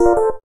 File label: gayageum star, keytone; SKY IM-A850S-VEGA-R3